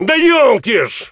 stalkers hit; customnpcs

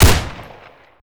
Sterling L2 fire; STALKER

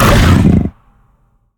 chimera pain; mutants; STALKER; customnpcs

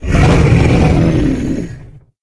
giant idle; mutants; STALKER; customnpcs

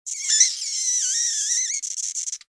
rat agroed; STALKER; customnpcs

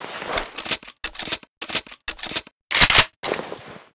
reload; marauder m1010; STALKER

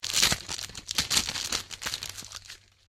inv medic; STALKER

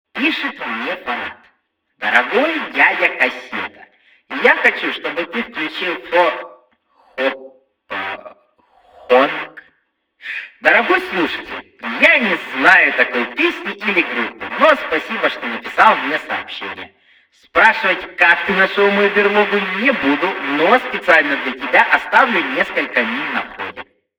radio noize; STALKER

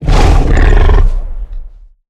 giant death; mutants; STALKER; customnpcs

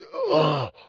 dolg death; STALKER; customnpcs

